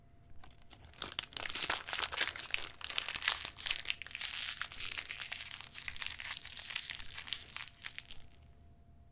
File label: Oatm Cooky Wrappr